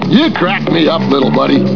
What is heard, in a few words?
crackup